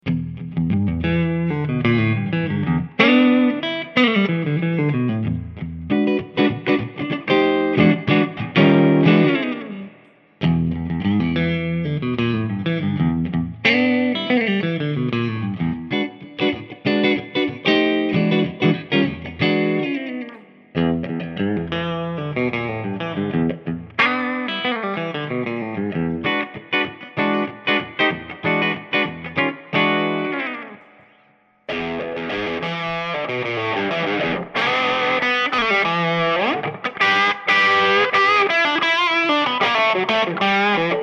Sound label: Mahogany/Maple/Walnut, electric guitar; spalt nouveau series lautrec yvette